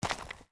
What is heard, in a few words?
FS Dirt, Footsteps